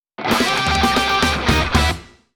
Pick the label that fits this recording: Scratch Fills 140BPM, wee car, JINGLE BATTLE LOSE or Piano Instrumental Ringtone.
JINGLE BATTLE LOSE